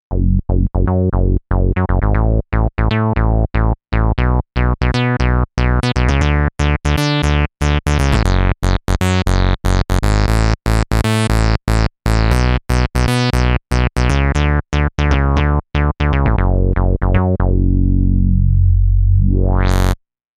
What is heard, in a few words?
Juno Reso Bs